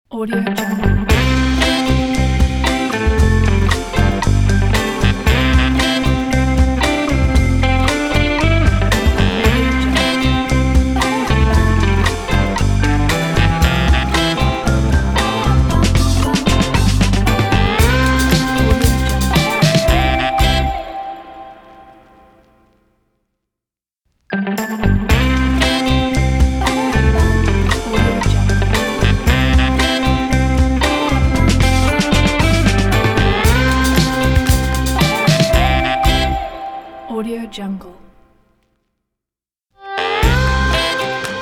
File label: acoustic indie pop fun logo